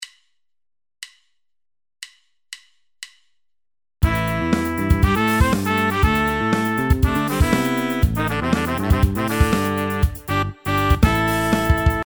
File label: Horn Section